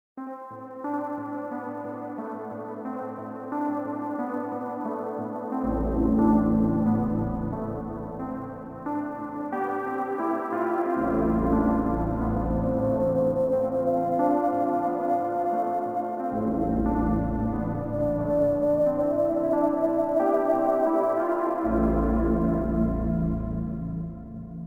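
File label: Day Ambience Stinger; dying light gamerip 2015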